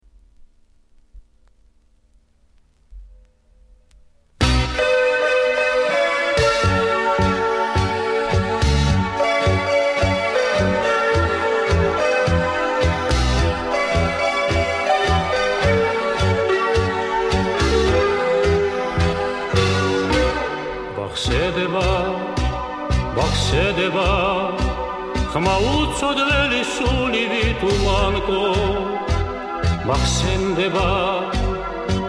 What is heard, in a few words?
tango